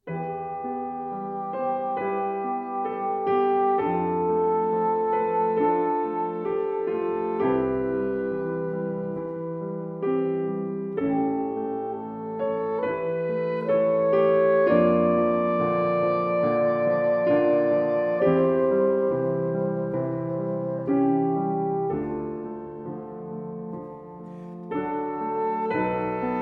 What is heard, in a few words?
Sonata For Violin and Piano, For Saxophone; Movement II